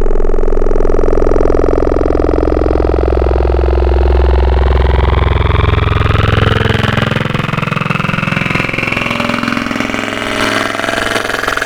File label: Fork Out, Serum Wavetables; Synthetic's Serum Collection; Cymatics - Nuclear for Xfer Serum Wavetables